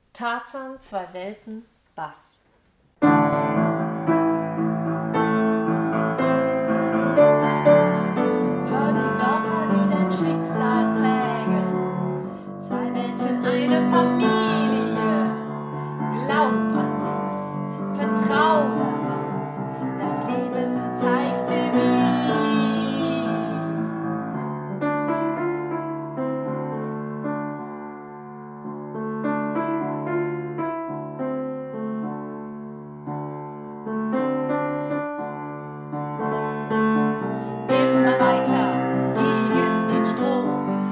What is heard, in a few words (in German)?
Bass; Tarzan